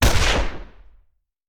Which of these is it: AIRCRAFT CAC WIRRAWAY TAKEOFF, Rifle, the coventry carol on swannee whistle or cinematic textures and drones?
Rifle